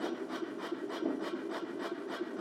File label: rotor slow; CrossCode; lab